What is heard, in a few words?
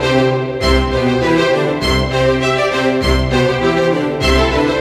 Blackthirst Strings